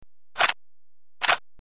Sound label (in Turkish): ak47 sarjor degistirme sesi